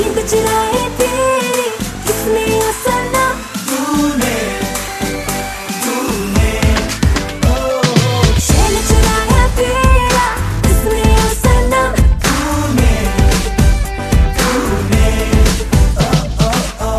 New Hindi Ringtone

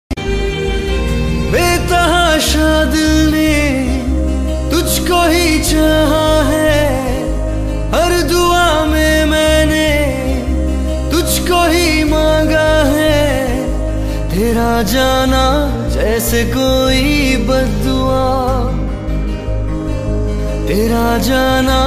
Hindi Song Ringtone

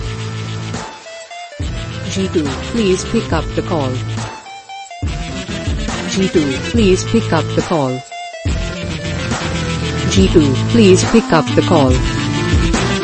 Name Ringtone With Music

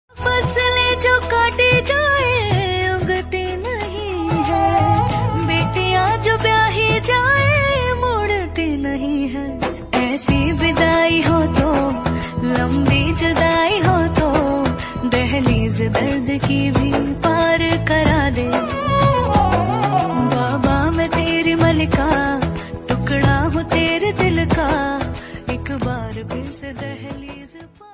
Bollywood Movie Ringtone

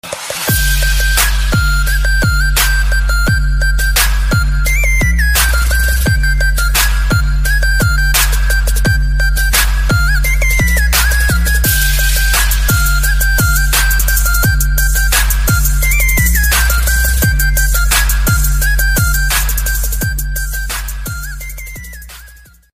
Arabic Music

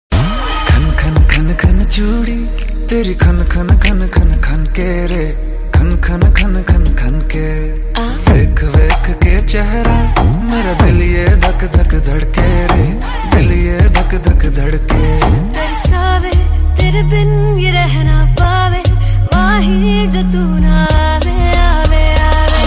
Romantic Song Ringtone

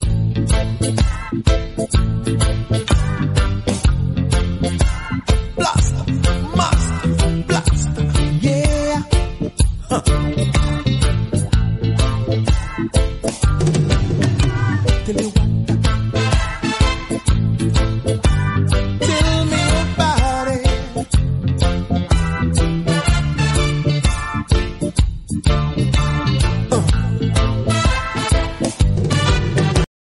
Phone Ringtone

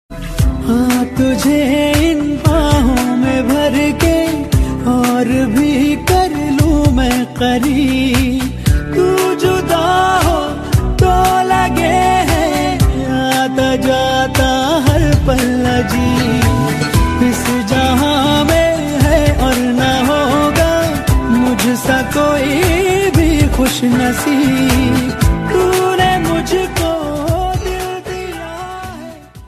Romantic Hindi Song Ringtone

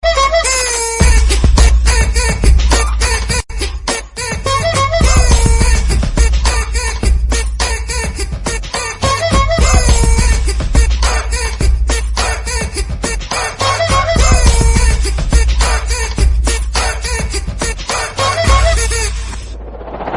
Dance Ringtone

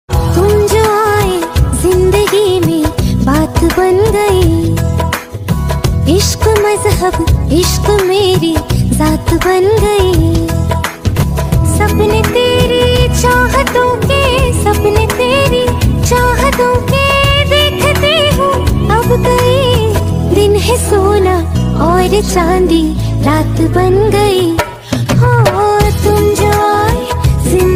Female Version Ringtone

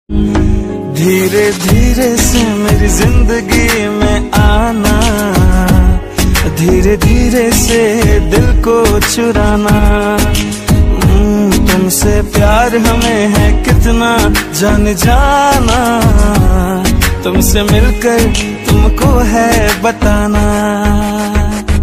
Old Hindi Ringtone; ringtones

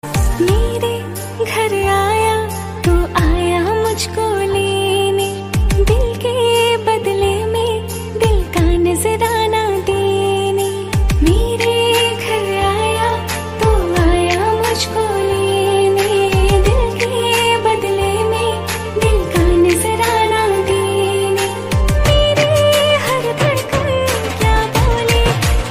Best Old Song Ringtone

